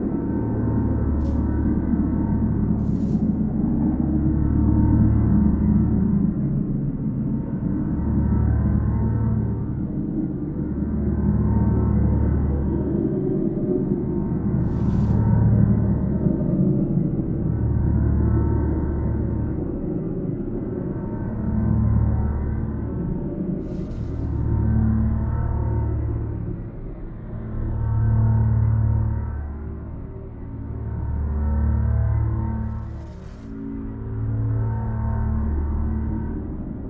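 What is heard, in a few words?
ambience shroud storm; Stellaris; cosmic storms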